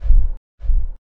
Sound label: Wings